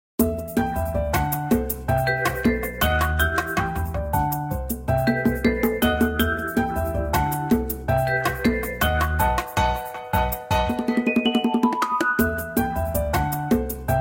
city salsa, ringtones; Sony Xperia C3

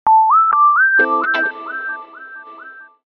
SMS Tone, notification; Walton Primo F3i

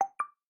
Usb; Vivo Y20s G; ui

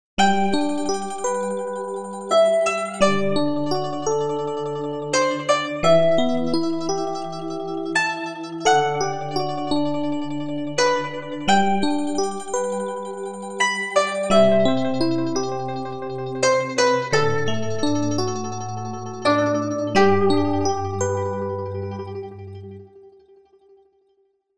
ringtong; Gionee GN205

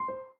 Particle Lock; Samsung; Galaxy S6; ui

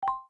Video Record End; ZTE Axon 20 5G; ui

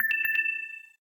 Low Battery; LG; Velvet

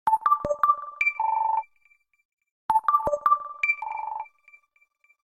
Minimal tone Default Ringtone; Samsung SPH-D600 Conquer 4G; ringtones

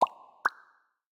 Drip Drop, notifications; Samsung; Galaxy A56

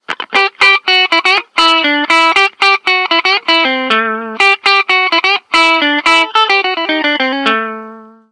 jazzy; Lenovo; A390

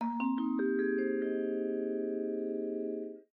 Moonlight, notifications; Samsung; Galaxy J4 Core

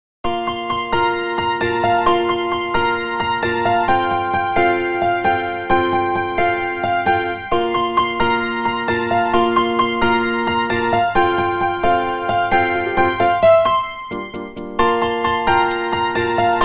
ringtong; Gionee V185

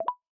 Unlock; MagicOS 7.1

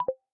Lock; Honor X9c

